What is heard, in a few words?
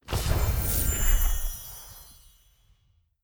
small Win; netent